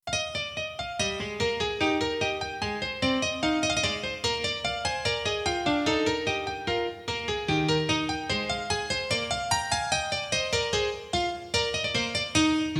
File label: I like Piano